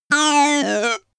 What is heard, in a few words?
giggle tube down, toys; comedy-cartoon; spielzeug